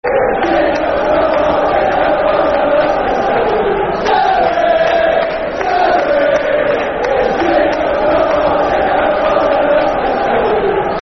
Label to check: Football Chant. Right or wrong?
Right